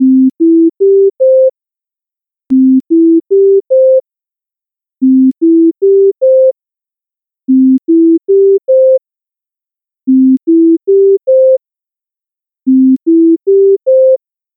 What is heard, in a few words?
Cardiac High Priority